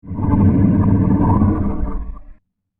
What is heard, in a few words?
Movement Underwater Shipwreck; Boat Sound Effects; fantasy sound effect